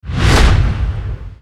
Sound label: weapon swing; gwyn